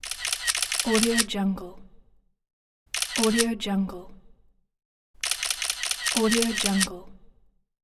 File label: camera shutter